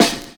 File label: SD, snare, 70 s Breakbeats